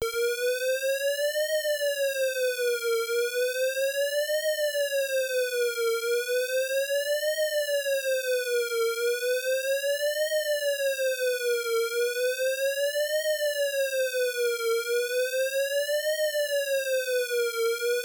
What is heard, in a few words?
Radio; Metal Gear